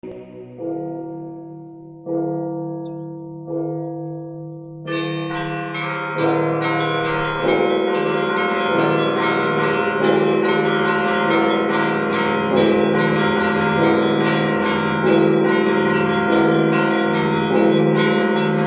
ЗВОН ВВЕДЕНСКИЙ; Параклисис Божией Матери

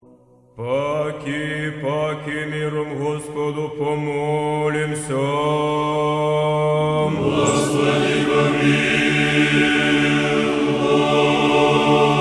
Malaya ekteniya, Kievo-Pecherskij raspev

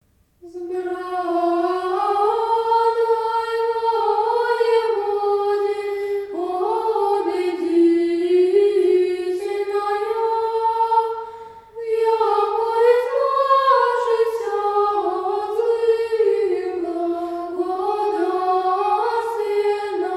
Vzbrannoj Voevode (Kondak Akafista Presvja Toj Bogorodice, Glas 8

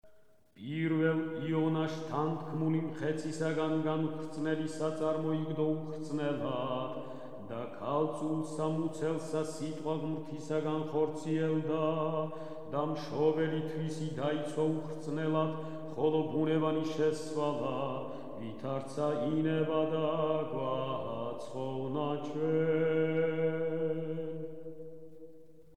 irmos iz kanona; Rozhdestvu Hristovu

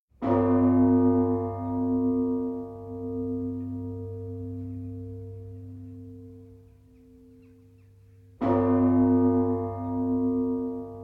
Lavrsky zvon; Svyato-Troitskaya

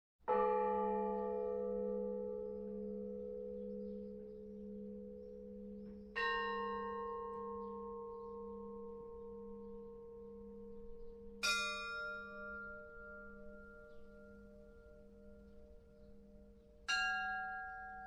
Zvony Velikogo Posta; Velikij post; Blizhnih pescher

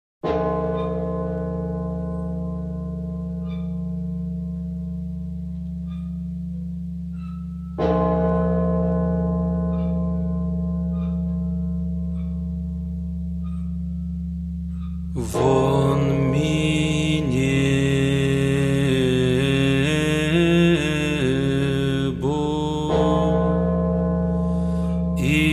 blagovest irmos; German

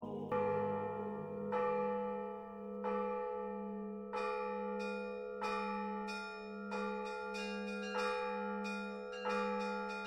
Trezvon kolokolni; na Blizhnih pescherah

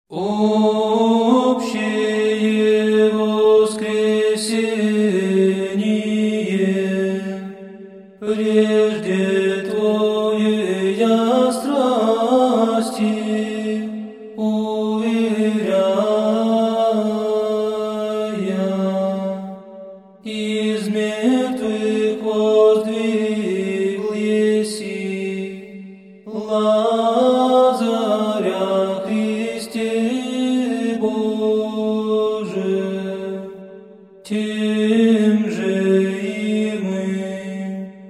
Tropar obscheee Voskesenie, glas 1; Postnaya Triod

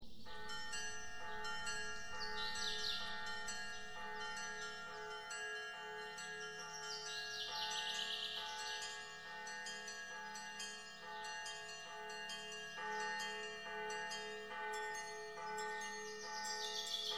Perezvony kolokolni blizhnih pescher

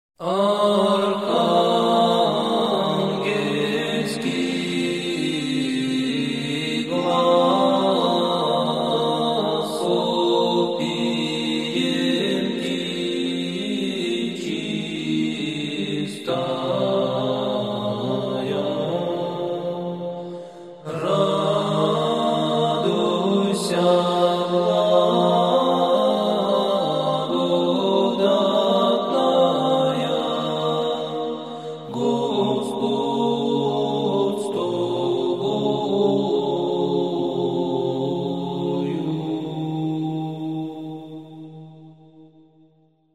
Arhangelskij glas (znamennyj raspev); Dom Presvyatoj Bogoroditsy

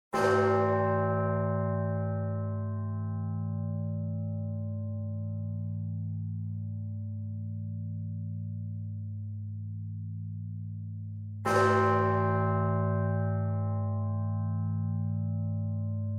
Blagovest, Zvon bolshoj Lavrskoj kolokolni; ko Vsenoschnoj

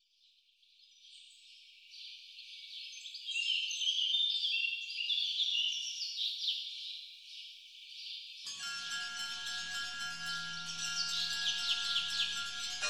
Perezvony kolokolni blizhnih pescher

